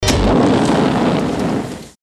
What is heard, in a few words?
impact